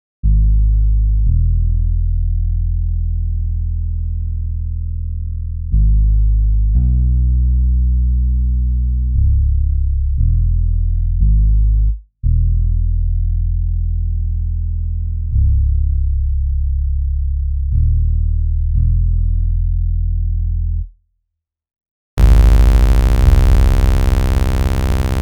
bass stem

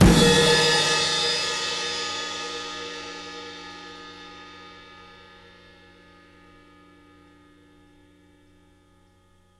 crash

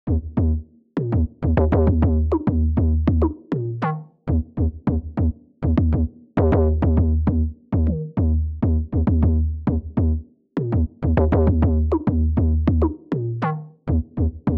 bass

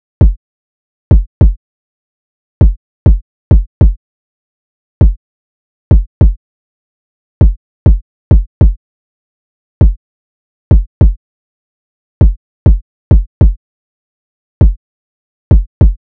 defeated kick

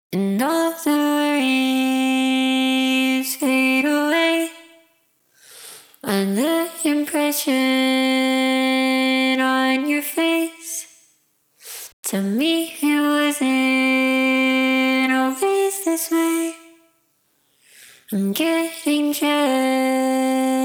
worries acapella

